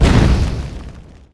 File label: tree path explosion